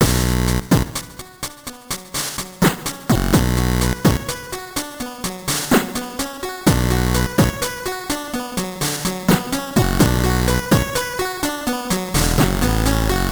Low funk Intro; high strangeness; wii u

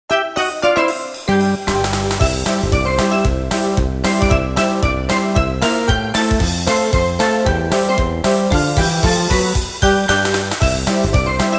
Stage 1, Game Boy; Super Mario Land; Game Music Chronicle

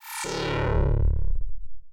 grind; grind MASTER; Particle Mace

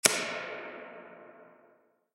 Click

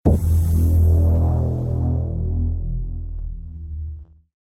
Logo Sting; neon shadow

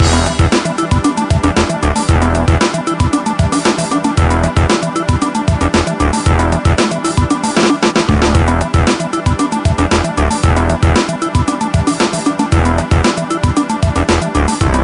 Seeking; Blox Hunt; Roblox

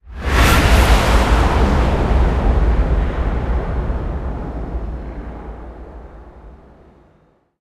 echoes; thief

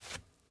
dirt jump; teardown; ps5 windows xbox series xs

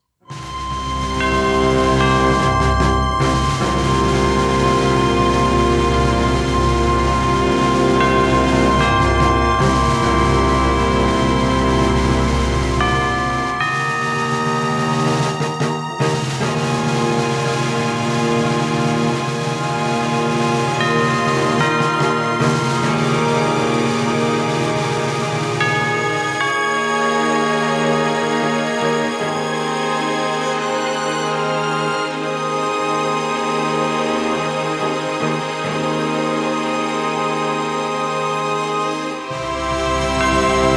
National Anthem; Advance Wars 1+2 Re-Boot Camp; Blue Moon

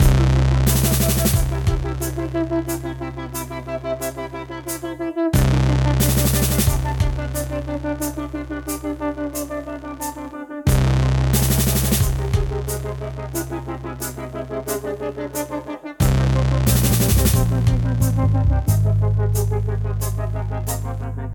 Death Dark short

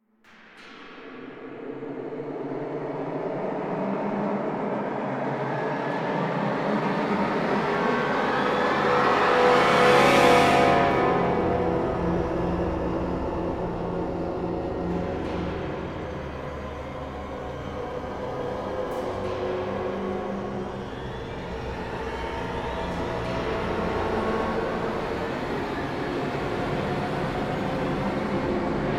Music Ambient Freaky; StarCraft 2 Wings of Liberty; MissionMusic

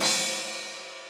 Drum Score

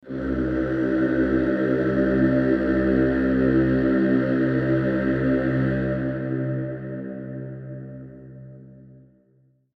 Choir Low; Animal Gods

